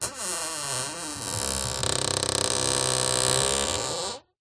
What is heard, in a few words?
household door creak